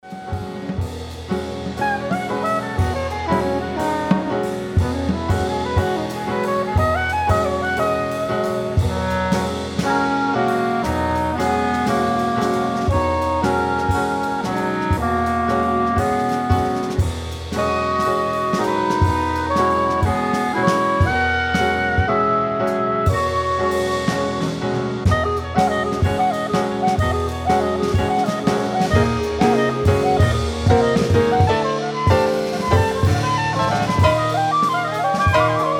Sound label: Rising Modes